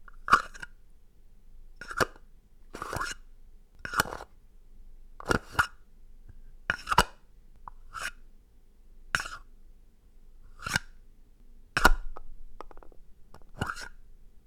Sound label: Plastic Box Open Close